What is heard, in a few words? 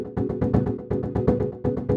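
122 BPM Sequence A major F minor Tonic B; innovative sequences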